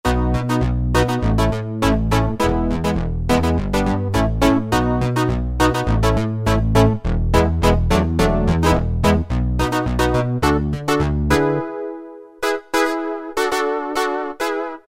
PROPHET FUZZ, Kurzweil K2000 Analog; Kurzweil K2000 Best of Analog

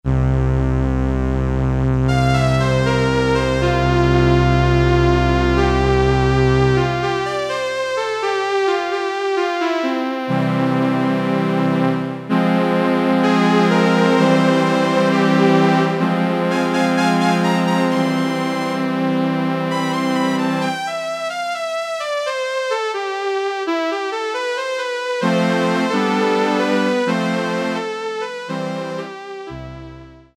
SYNTH STRINGS, Yamaha PSR-300; Voices

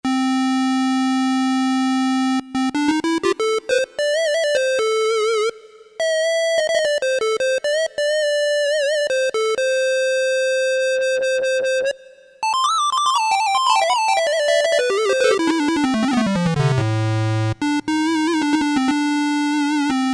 Square, Korg DSS-1, Created Waveforms

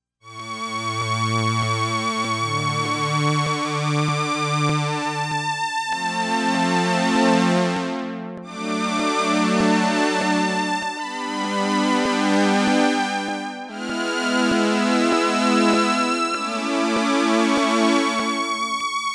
Strings II

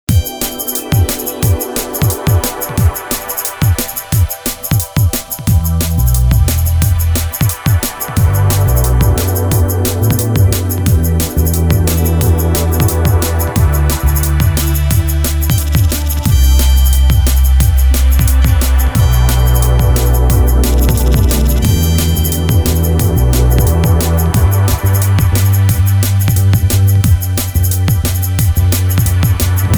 Jazz Step DnB

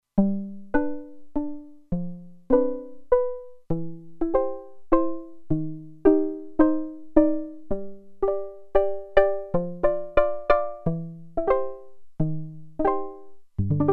Pizzicato, Roland Alpha Juno-2; Original Sound Chart patches

